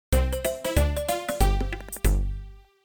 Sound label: Level Complete; Gold Miner Joe; ios macos windows